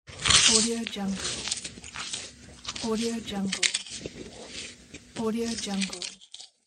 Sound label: chewing